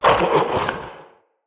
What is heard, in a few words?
Male Vocal Pain; Enemy; Mockery; Mockeries